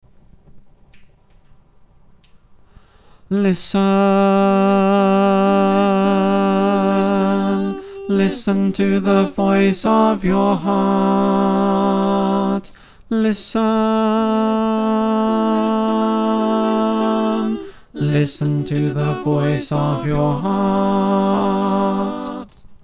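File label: bass, choir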